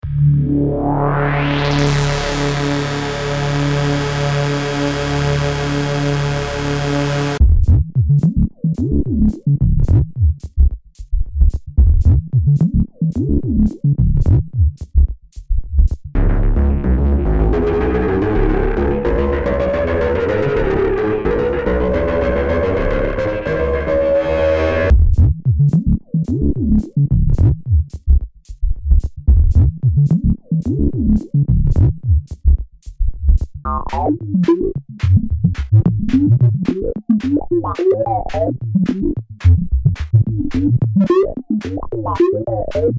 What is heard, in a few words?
Loops